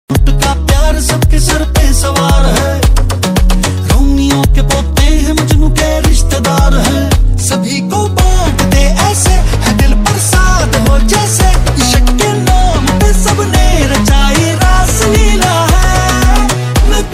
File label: hindi ringtone